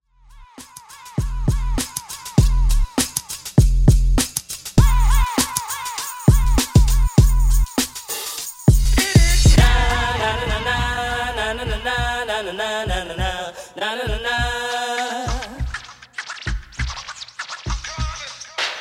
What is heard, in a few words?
Classic Hard Redrum 100Bpm; djyan